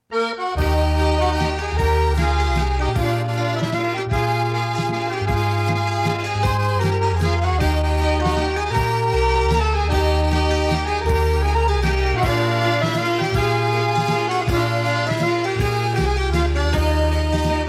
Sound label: Dawn Waltz